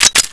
aug deploy

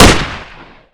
elite fire suppressed

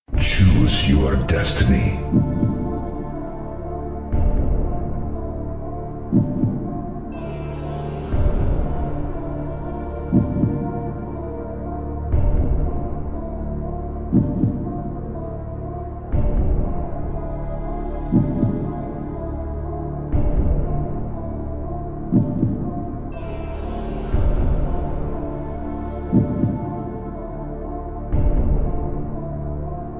zombie

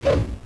usp deploy

